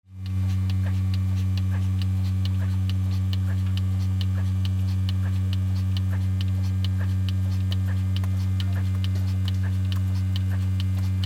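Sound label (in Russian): доильная машина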